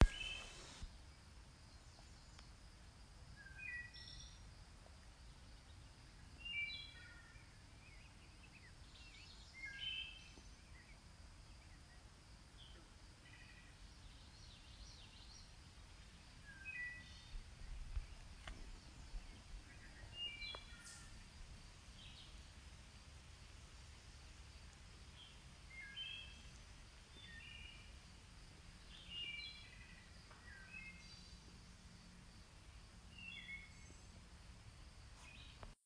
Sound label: Thrush